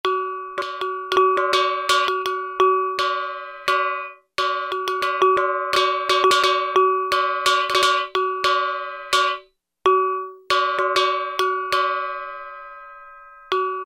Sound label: Gamelan Kajahru; Yamaha A4000; World - Latin Instruments; Arabic & Gamelan